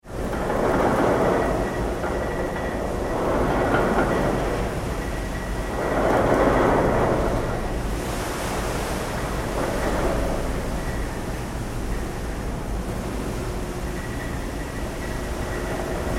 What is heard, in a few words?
Ship Oil Pipeline Service Vessel Sea in Moon pool; Tom Hutchings